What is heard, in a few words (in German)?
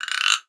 GURKE, PERCUSSION; Best Service ProSamples vol.42 - Session Instruments; PS-42 Session Instruments